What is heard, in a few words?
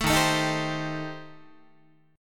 D sharp 6th Flat 5th, D sharp, down, Guitar; strummed; JGuitar